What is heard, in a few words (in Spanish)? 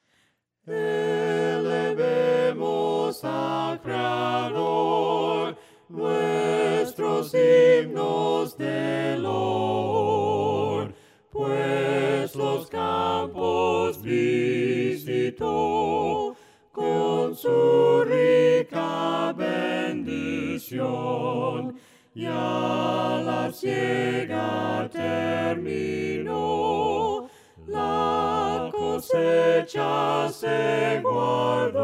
VOCES, himnos a capella; cantos del camino